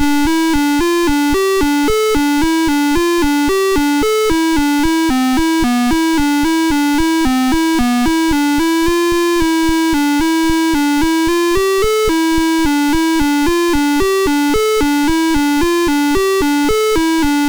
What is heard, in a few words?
UFO II BASIC, MOS6581R3